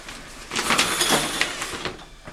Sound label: shower curtain